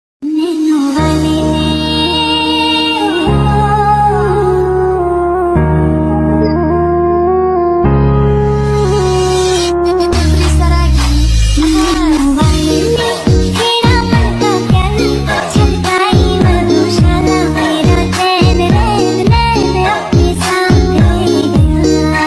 adivasi ringtone, romantic ringtone, love ringtone